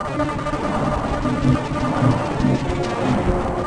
JUMBLE MIC; ENVIRN-AMB

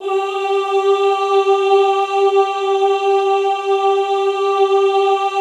OOH G3, Classical Choir; Best Service; Extended Classical Choir; OOH MIX

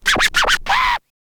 scratch kit, vinyl

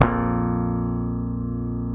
A#, Bass; Sampleheads; Will Lee Bass Library

